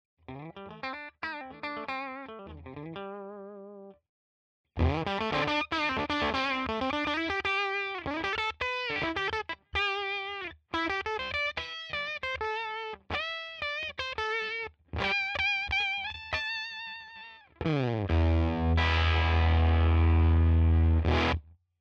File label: Octa Hive white Riff C less gain